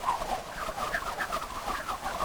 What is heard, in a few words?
Projectile Fly Loop; gamerip; Mantis Heavy Flyer